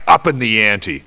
LAUGH; BattleSNDS

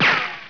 RICOCHET

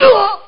hit; BattleSNDS

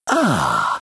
humm; BattleSNDS

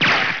RICOCHET

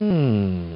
humm; BattleSNDS

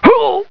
HIT; BattleSNDS

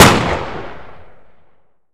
single, 4.7x33mm; Caliber

